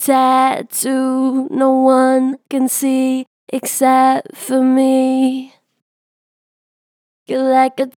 Vib Tatoo no one can see, Vocals, Natural Vibrato Strong; Harmor Vocals